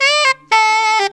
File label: SAX LIC; AMG - Now CD-ROM (Roland)